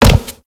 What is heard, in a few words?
Grineer Step Metal, Footsteps; Enemies; Warframe